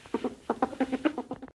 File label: ferret happy; Animals